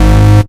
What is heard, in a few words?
Bass Shot G#